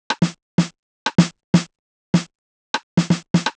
drum loop bust percussion 125, Classic Chicago House; Sample Magic; Drum Loops